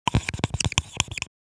Whiteboard marker office stationery writing screech